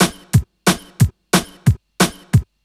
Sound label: Uptempo; groove agent one